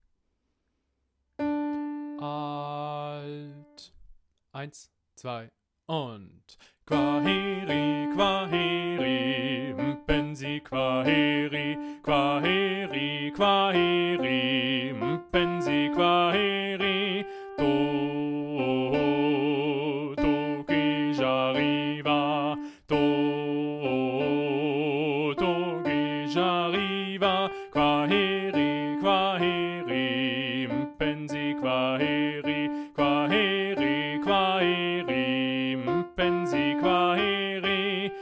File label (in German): Alt allein; Kwaheri